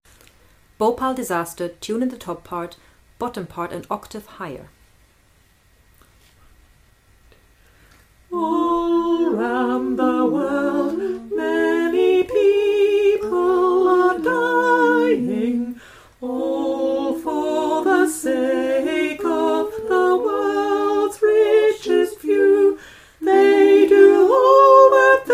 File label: tenor